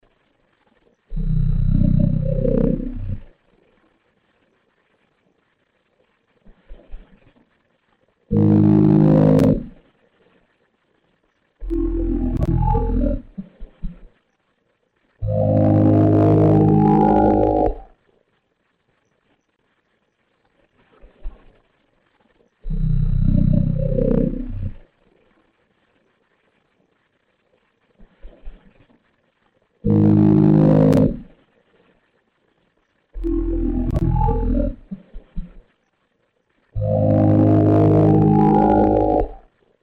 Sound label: tones after nap